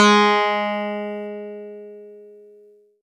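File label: Easy Clav DX100 G#1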